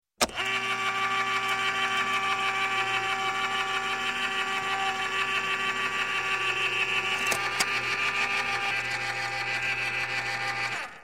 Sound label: Can Opener, Electric Opening Can With Electric; Sound Ideas 2000 Series